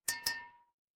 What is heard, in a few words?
glass clink